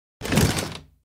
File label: chest open